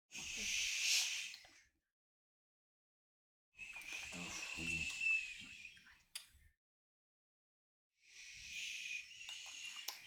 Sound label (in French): PUBLIC PETITE FOULE SE TAIT CHUT SILENCE